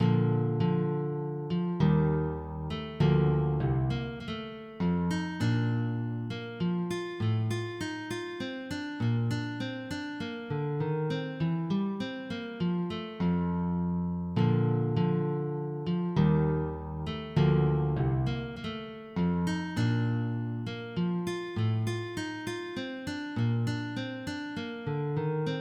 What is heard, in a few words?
Sarabande, MIDI